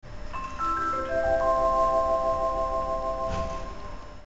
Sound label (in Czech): znělka; Chaps